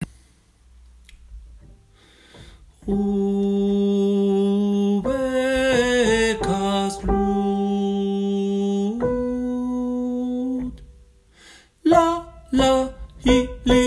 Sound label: Tenor